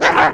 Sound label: wolf attack